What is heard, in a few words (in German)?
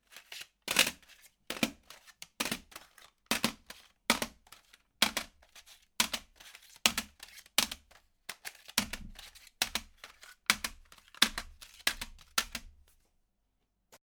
cds stapeln